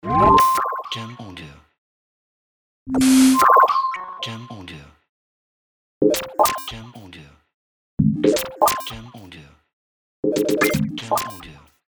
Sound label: Glitch Effects